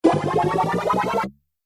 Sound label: Ufo Tractor Beam Pulse; Spacecraft Sound Effects; Science Fiction Sound Effect